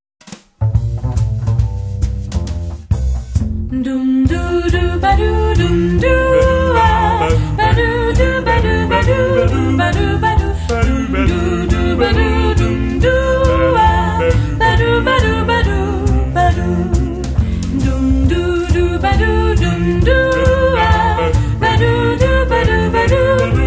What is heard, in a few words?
Swing the prelude SAB Instr; L. Maierhofer; Arr. nach dem prelude von M.A. Charpentier; Klassische Themen arrangiert von L. Maierhofer